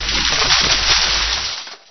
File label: Shriek; 暴力赛车